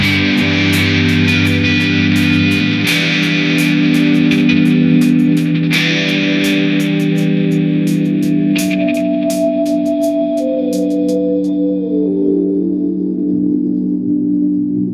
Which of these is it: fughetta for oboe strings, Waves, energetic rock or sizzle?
energetic rock